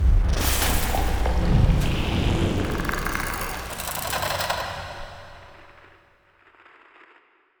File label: event first contact aquatic; Stellaris; aquatics